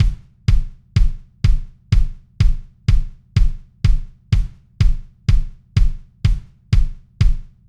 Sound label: acoustic kick w Sub